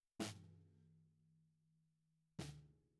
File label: SNR Bot